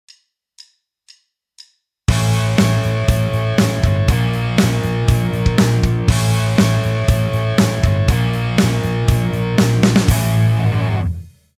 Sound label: backing; Guitar Techniques 2017 Issue 272 August